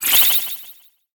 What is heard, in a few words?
spy penguin border on